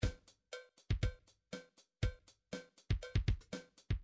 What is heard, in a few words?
funk rock, midi